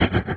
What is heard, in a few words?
poison